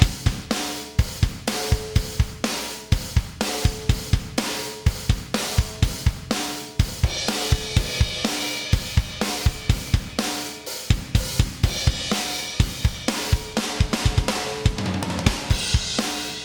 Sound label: drums processed no dist